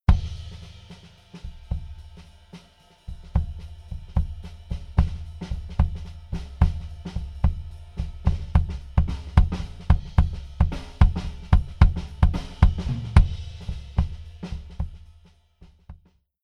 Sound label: Drums Kick